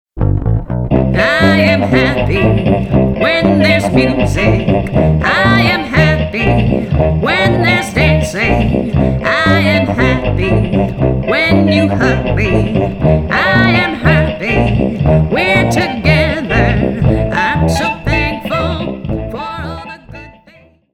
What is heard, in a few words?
Happy Song